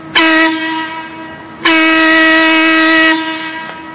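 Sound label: ringing horn of approaching steam locomotive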